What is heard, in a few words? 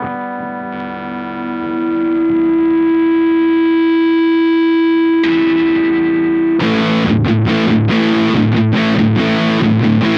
Godin Radiator Burst high gain both